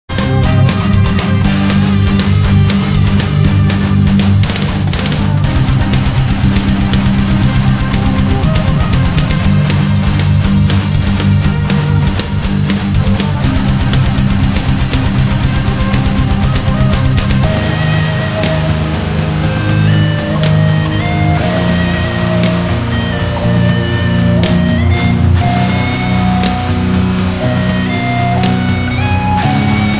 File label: 6 strings and doublebass